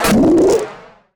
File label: dark gun vox nooo blast brush; music and sound fx; music and sound fx master collection; nova sound